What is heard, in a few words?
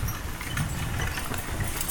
Horse Drawn Wagon By; Electa Quinney